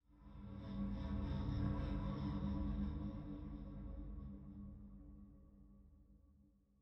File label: Citadel Ghost Pad